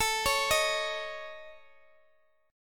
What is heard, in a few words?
A Major Flat 5th, Guitar, Open D